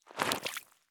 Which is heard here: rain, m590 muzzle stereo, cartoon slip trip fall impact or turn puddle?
turn puddle